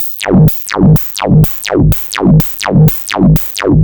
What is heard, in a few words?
Drums Strange